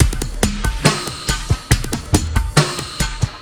SloMo Beats, Loops 140 BPM; Vengeance - Essential Clubsounds Vol.4

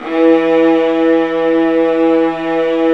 Violas Bright, String Sections; Roland LCDP13

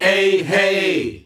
"ehey" G, MALES; Best Service; Hyper Dance

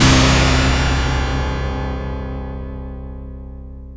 O-HIT, HITS

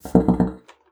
BASSOON; Xsample VOL-6

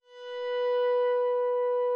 NYCKELHARPA H 1; SoundBytes - Early Patches

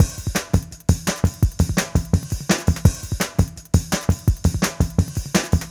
CYCLONE KIT, PROCESSED ACOUSTIC DRUM KIT; Sonic Foundry (Sony Creative Software); Vir Unis Infusion

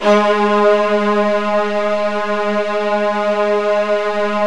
Virtuoso Strings

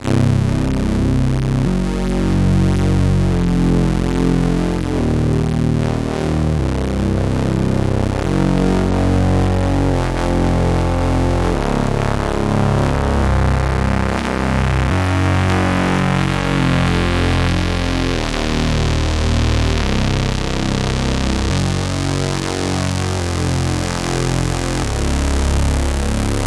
C64 Slow Bass Filter, Commodore 64, Chiptune; 8-Bit

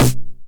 909 SD Tape Sat

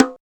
TR-727 BGO LO, Drums

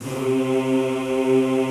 C2 L, Classical Choir; Best Service; Extended Classical Choir

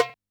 DJEMBE, Yamaha DD-65

